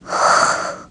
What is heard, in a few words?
Sleepy; Sheik turned sleepy; Zelda